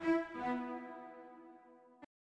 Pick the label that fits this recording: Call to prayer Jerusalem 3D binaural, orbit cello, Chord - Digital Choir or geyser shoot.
orbit cello